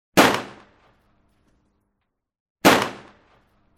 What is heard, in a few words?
2 Police Pistol Shots; SOUND EFFECTS1